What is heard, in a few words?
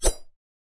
Sword Hit; raycaster